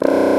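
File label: Contra Bassoon, B0; Digital Sound Factory Virtuoso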